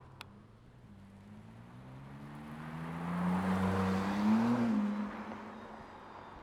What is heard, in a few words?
Car Drives Past